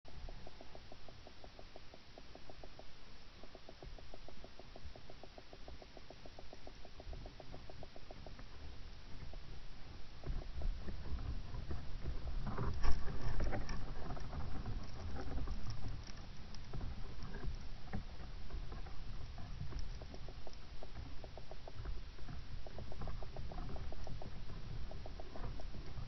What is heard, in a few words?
Stout Twig